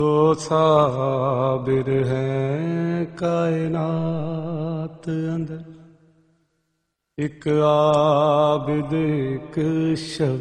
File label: noha tone